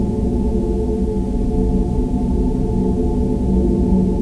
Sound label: generator